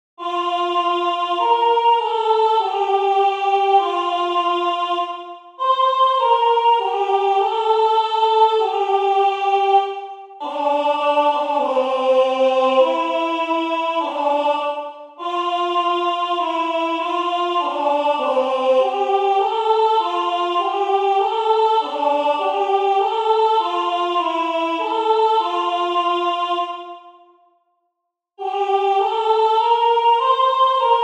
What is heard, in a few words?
Ave Maria Alto; Monteverdi; Baroque